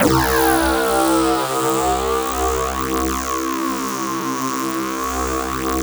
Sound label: RING BASS; Spectrasonic Distorted Reality 1